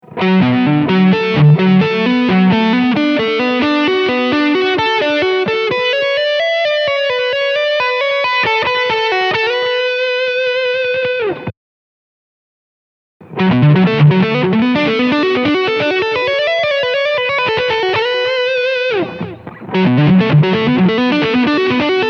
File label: SImin7